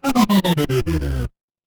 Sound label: retro game enemy death